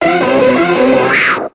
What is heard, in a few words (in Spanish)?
Grito de Hypno